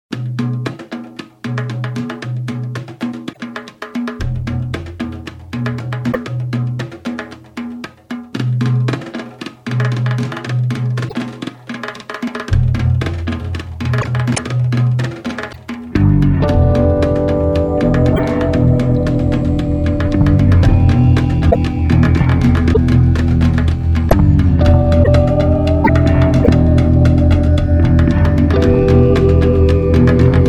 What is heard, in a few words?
Drums in Sleep; cyberCHUMP